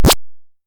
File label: Box; DodgeBox!; 3DS